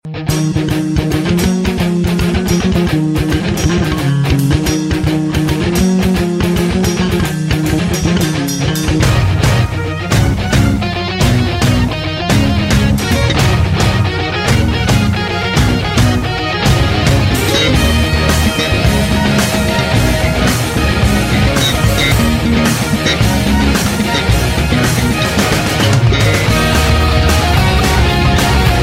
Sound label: Rock; Banglalink